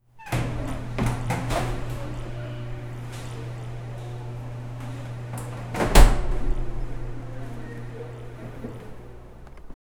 video store door opens

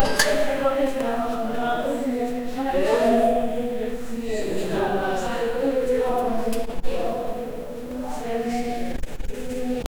the echoing noise inside, prison

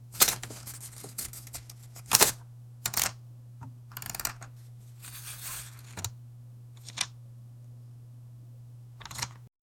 the dealer takes a card

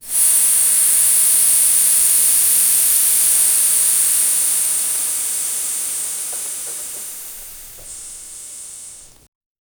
subtle steam hiss

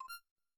create ui and menu sound

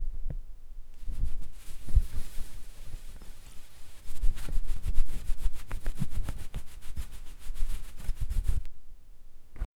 human body push with, cloth rustling

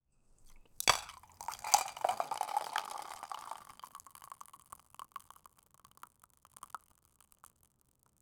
asmr sound of milk being; liquids

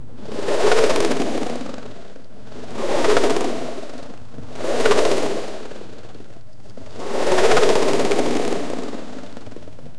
sound of a thin, spool

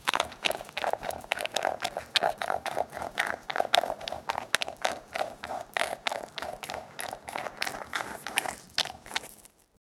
cat movement frantic spr; sprinting

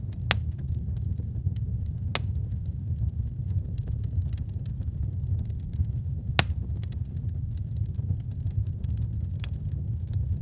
fire

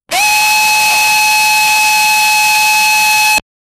siren blaring loud; warning

